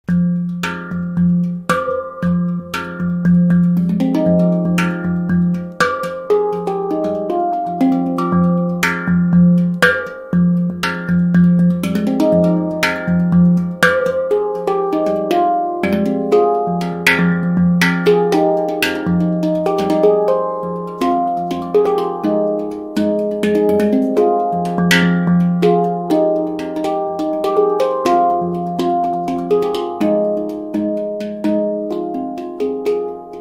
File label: handpan; earth